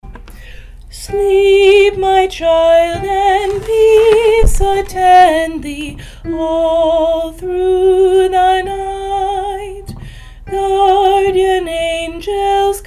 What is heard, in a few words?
all through the night duet, bottom part